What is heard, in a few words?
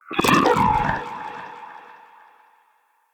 Shrimp Vocal Death, Creatures; Vocals; Baliq Shrimp Glade